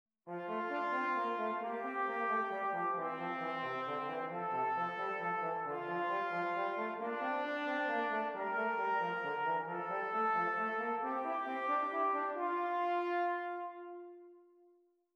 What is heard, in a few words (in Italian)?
Esercizi di contrappunto a due voci; Dritte Gattung; Ronge pagina 65